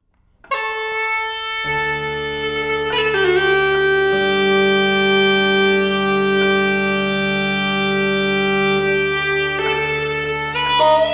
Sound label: Irish Uilleann pipes; Bagpipes